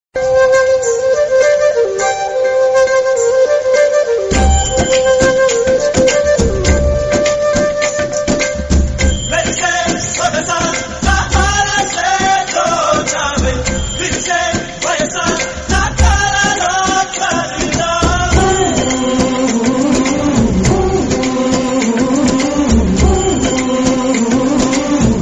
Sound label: bgm; rail movie